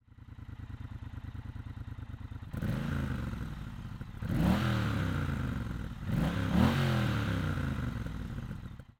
Speedmaster Closed; TRIUMPH

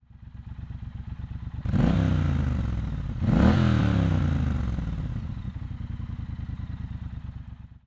Speedmaster Dynamic, TRIUMPH

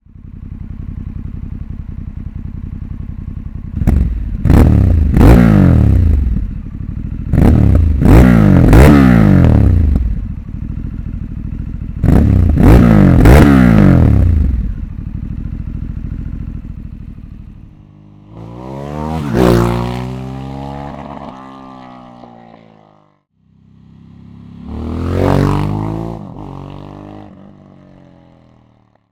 Mr Hyde Mode with ride by; APEX

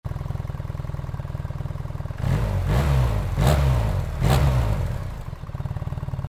BMW R 1300 GS; Dr-Jekill mode

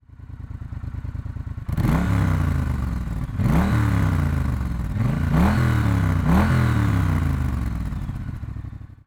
Bobber Dynamic; TRIUMPH

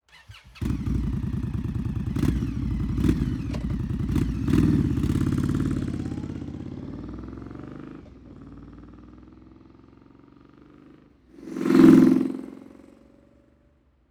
Scout Closed; INDIAN

